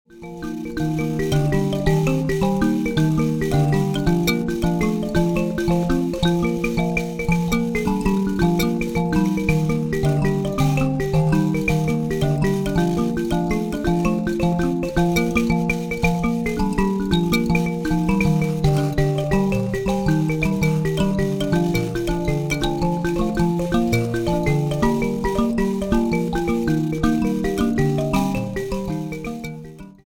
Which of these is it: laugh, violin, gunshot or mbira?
mbira